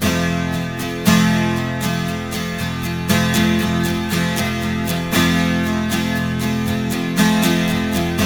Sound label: 12 STR MAJ F#, TWELV; Spectrasonics; Hans Zimmer Guitars VOL-1